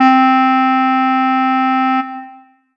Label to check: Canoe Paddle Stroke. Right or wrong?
Wrong